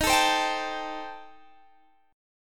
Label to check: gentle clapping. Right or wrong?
Wrong